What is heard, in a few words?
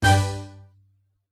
Battle Strike; Orca; Zelda